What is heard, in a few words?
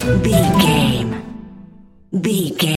music sting short groovy flute